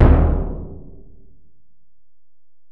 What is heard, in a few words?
Bell, Alesis DM-PRO